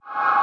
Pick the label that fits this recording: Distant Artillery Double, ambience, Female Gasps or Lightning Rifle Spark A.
ambience